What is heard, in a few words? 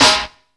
gold, snares; Zero-G; Funky Elements